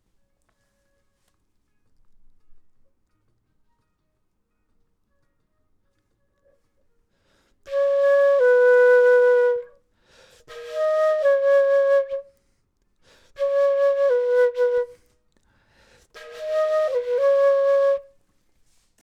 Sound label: flute; logic